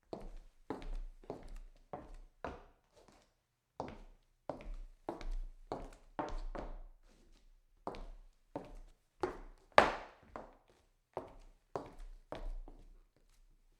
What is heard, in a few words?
pace quickly on wood, foley